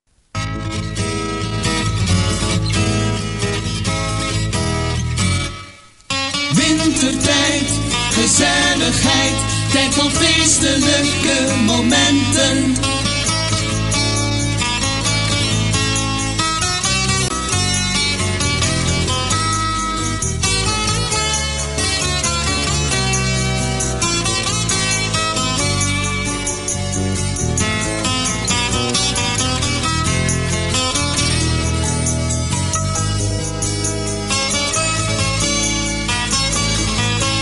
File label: jingle; Flash